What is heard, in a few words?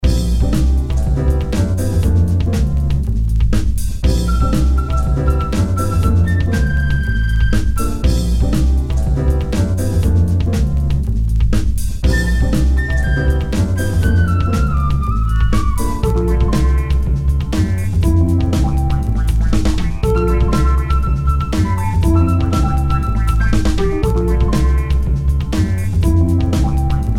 acoustic set